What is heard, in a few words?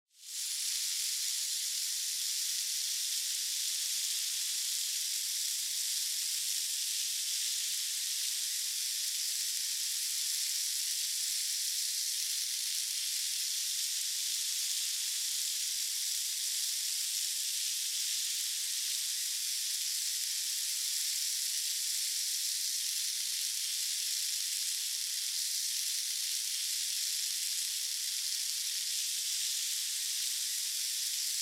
Hourglass 1 Minute; タイマー機器